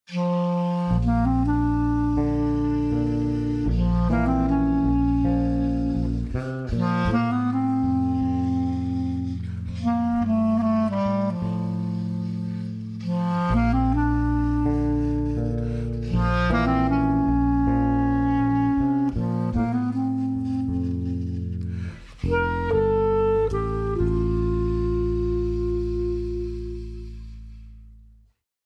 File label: Clarinet